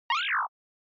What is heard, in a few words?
Motion Slide Mid High